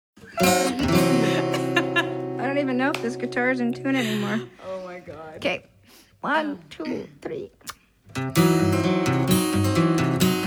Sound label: folky song funny note